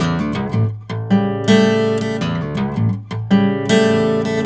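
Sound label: baritone riff, Blues Guitar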